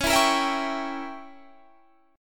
C sharp Diminished 7th C sharp down Guitar Standard; C#dim7 strummed; JGuitar